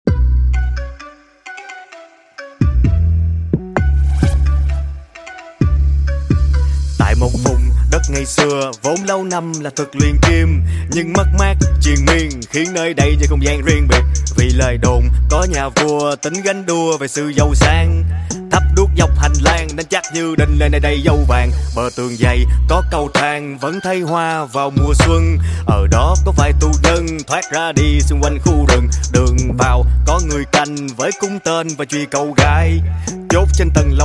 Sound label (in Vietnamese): nhac rap